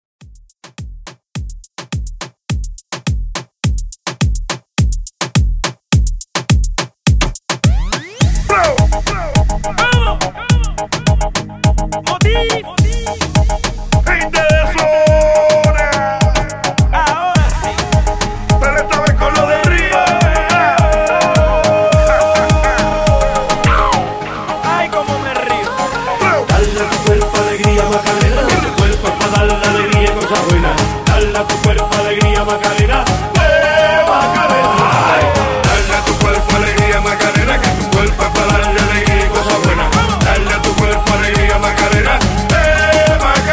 redrum 105 Bpm clean

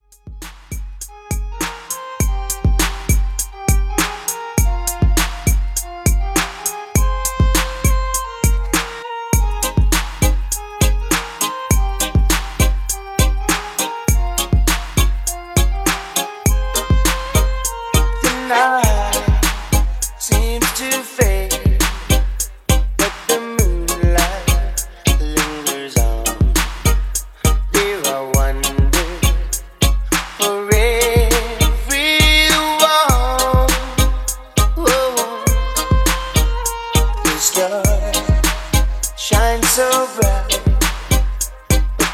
Reggae 90s ReDrum 101 BPM

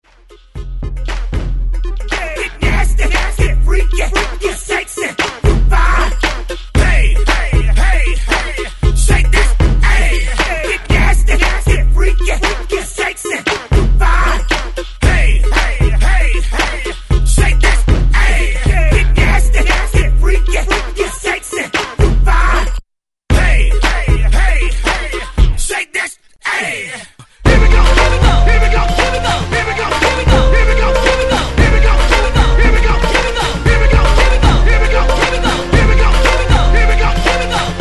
Party Breaks 116 Bpm; DJDOLLS

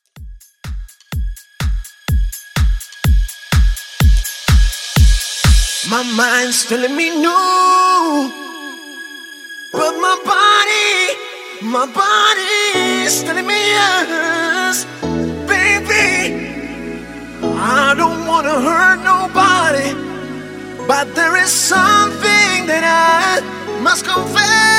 Deep House, 125 Bpm

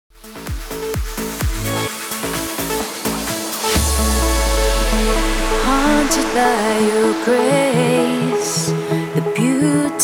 PROGRESSIVE CUT, 128 BPM

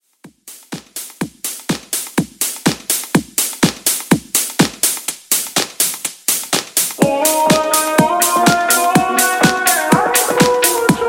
Top40 Club 124Bpm; ReDrum

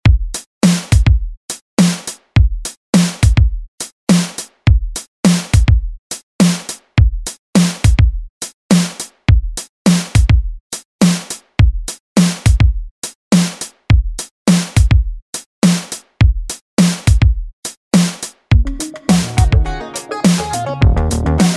Remix 104bpm

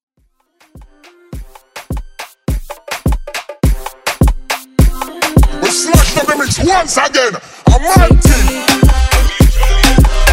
Moombah Mixshow

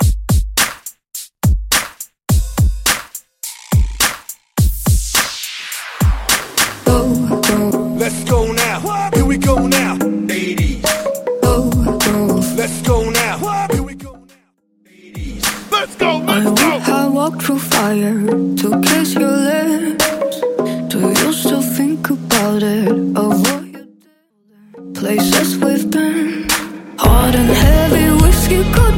Top40 Dance Mixshow Hype Into Re Drum 105 Bpm

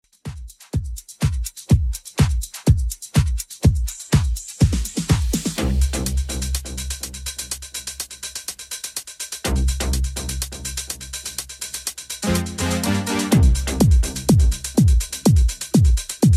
80s Redrum, 124 bpm

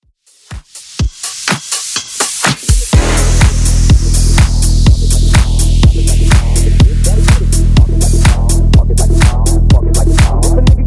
Bass House Dancemix 124bpm

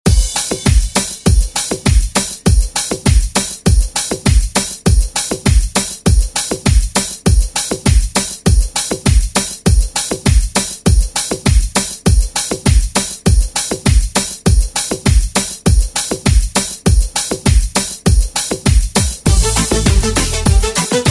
90s ReDrum

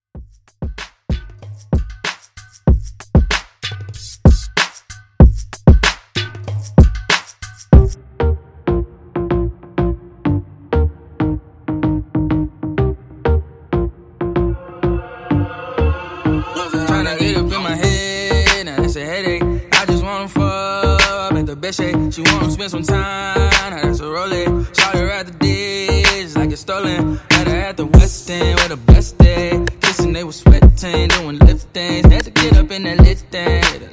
Hip Hop Redrum; Remixfreakz

